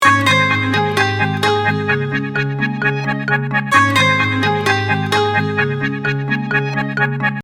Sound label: Synthesizer Ring; ringtones; mobile; Cisco